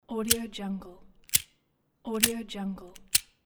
lighter

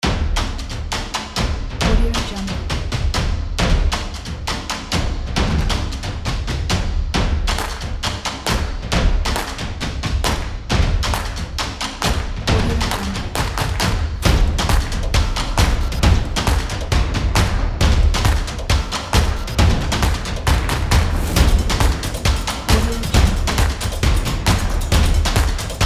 good drums

